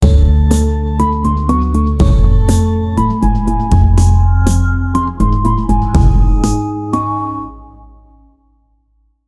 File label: feeling sorry music, sad